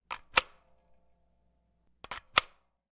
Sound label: gun ppsh; still north media